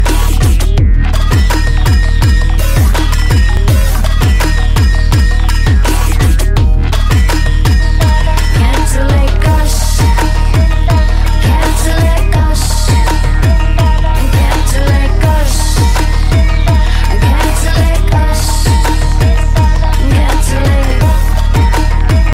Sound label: hindi mix music ringtone